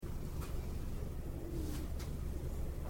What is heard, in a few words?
Growl; Paranormal Investigation Report at the Plank House